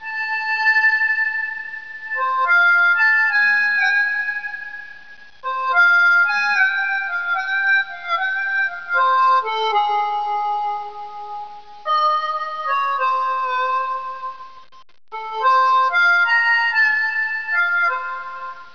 blues; cdromtoday

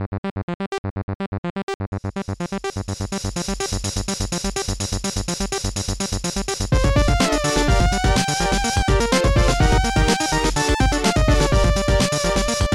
FIRE TUNE

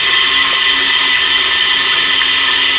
water pipe; PC Gamer IT CD 41; Daikatana

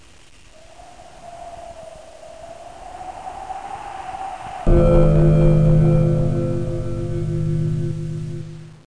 dong

